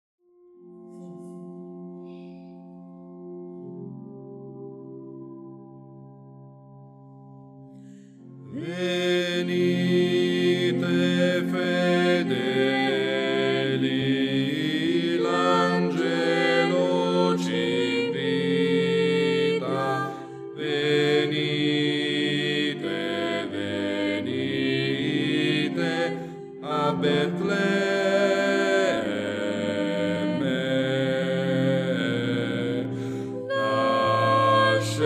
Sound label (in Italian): bassi